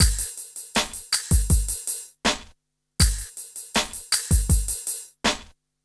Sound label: Smooth, R&B Drums